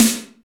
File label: snare roomy; Roland L-CD701; Snares 3; Sn Modules 3